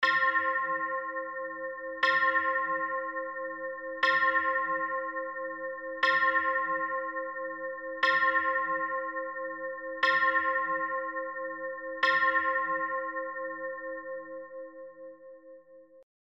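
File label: clock striking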